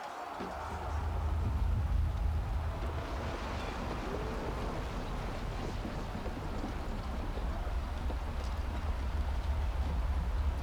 eastern front, ambience; pf2e; hells destiny

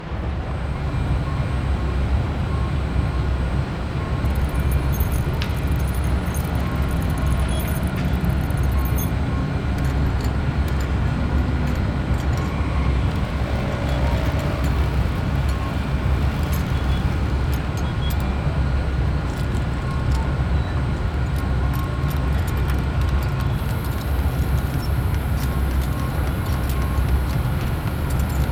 striving chase, Ambience; sf2e; murder in metal city

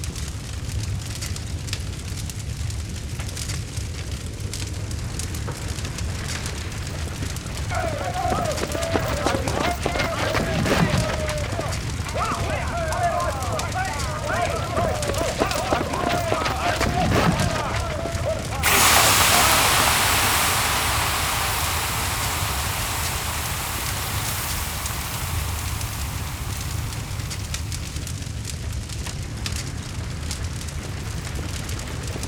carts and wheels, ambience; vuskers; pf2e; year of battles spark

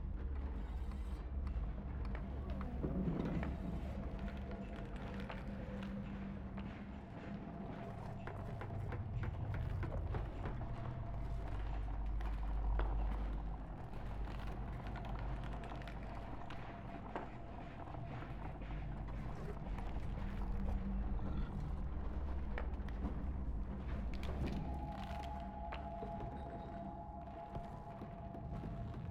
office; ambience; mechitar

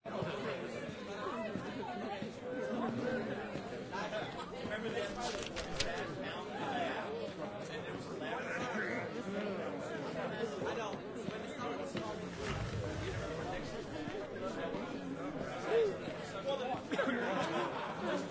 neutral indoor crowd; fa battlemaps

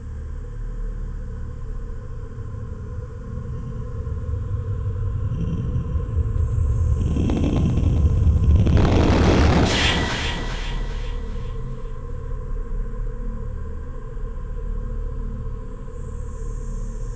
ritual backlash

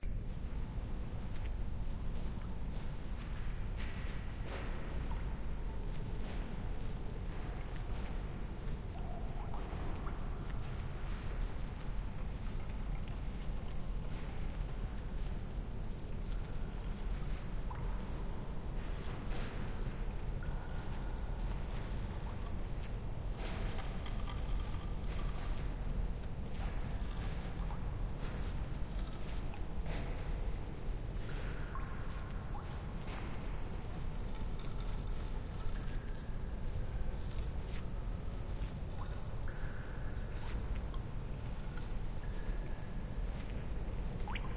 Dungeon; fa battlemaps

